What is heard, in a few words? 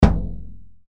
kettle drum